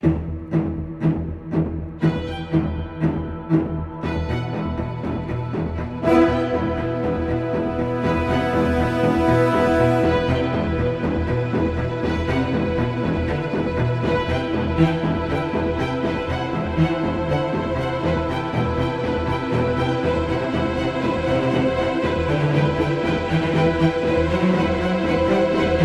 Agony Ambient

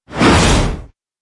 Sliced Wipe Wipe

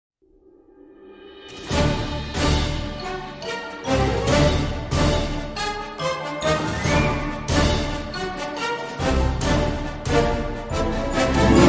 Dragon Flight (No Choir)

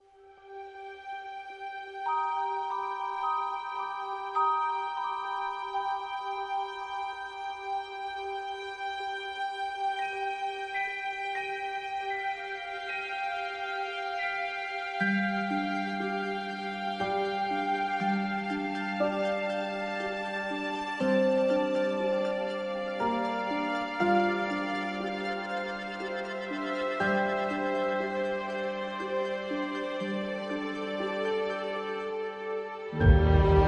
Cosmic Ambient

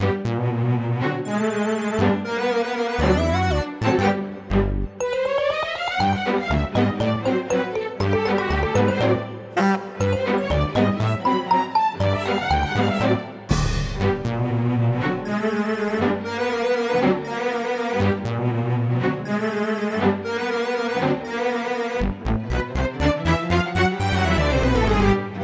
Slapstick Ambient; Comedy

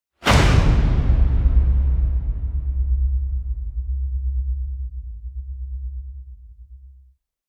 Attack Smash; Gothic Storm Music

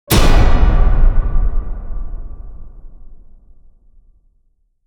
Rider Hit Metal; Ninja Tracks

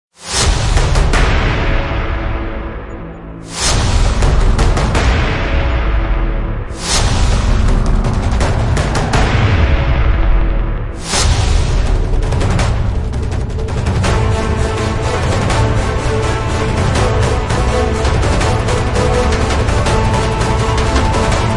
No Vocals

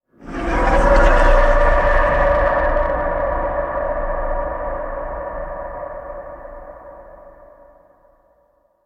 Alien Banshee Scream; Ninja Tracks

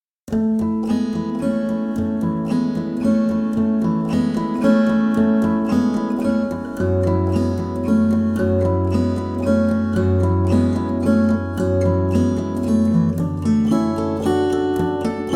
New World Flamenco

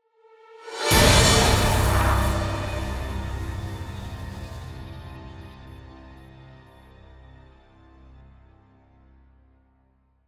Feverish Orchestra; Gothic Storm Music

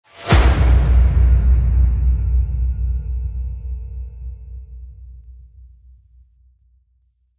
Epic Damage; Gothic Storm Music; Brain Warp (Sound Design Trailer Tools)

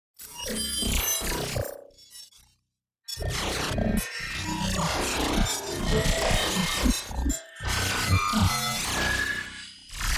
Storms Swarm; Gothic Storm Music; Advanced Sound Design 2012

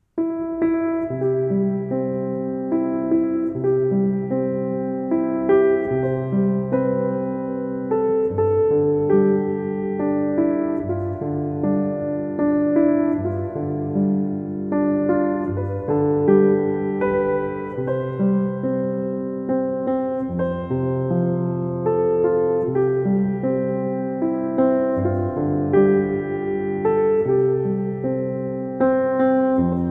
Brahms' Lullaby, Relaxing Piano Lullabies

